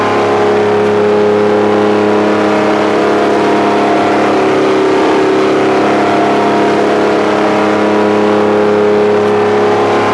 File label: off high ex, kart